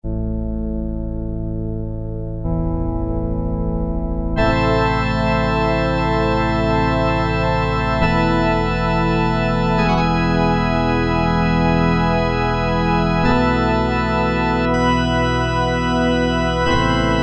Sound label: Pipes, Kurzweil K2000; Kurzweil K2000 V3